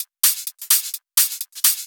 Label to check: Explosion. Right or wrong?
Wrong